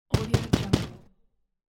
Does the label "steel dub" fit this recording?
No